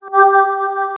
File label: synth like vocal calm fem